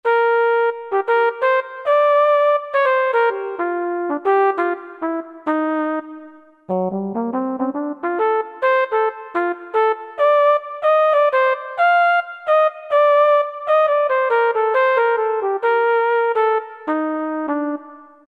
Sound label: Flugel Horn, Korg 05RW